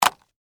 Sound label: Skateboard Down, Mini, Set, Cement, Surface; Sports; Skateboarding Sound Effects; SoundEffects-BlastWave